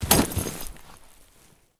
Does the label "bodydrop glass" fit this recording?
Yes